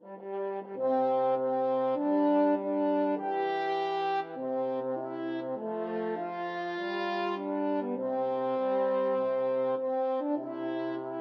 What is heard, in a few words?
french horn duet; la marseilleise